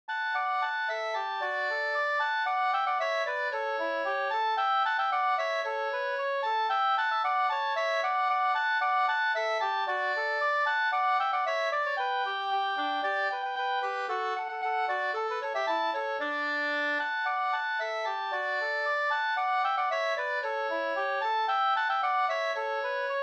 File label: polka polkett; e Olof Jonsson Havsnas Jm 2st